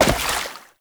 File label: Step Water, Foley; Warframe; Oraxia